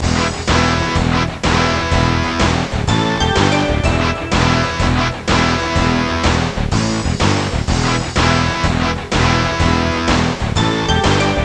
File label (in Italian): suonerie musica rock